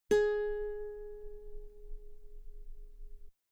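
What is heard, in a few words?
guitar G sharp 3 very long forte normal; bananenkeyboard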